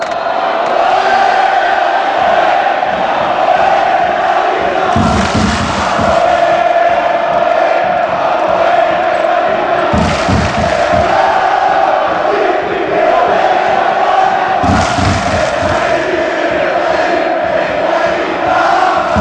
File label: war fanchants; hapoel haifa